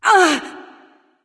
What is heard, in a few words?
grunt hit; smite; izanami